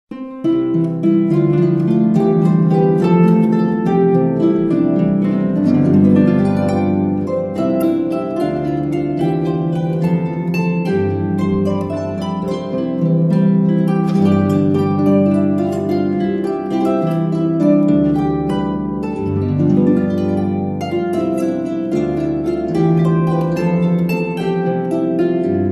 god give ye merry christmastide, christmas carols on the harp